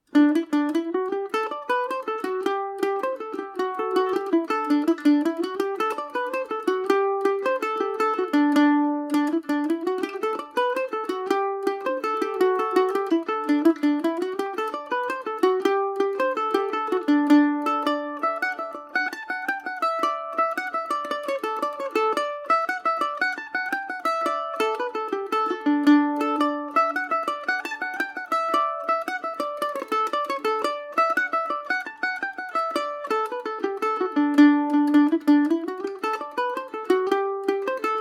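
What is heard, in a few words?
D mixolydian, Irish mandolin; i buried my wife and danced on her grave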